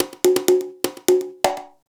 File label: PERCUSSN DISCO 125; Drum